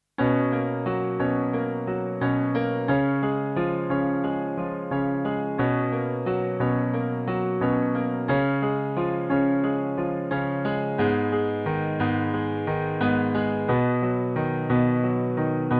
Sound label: piano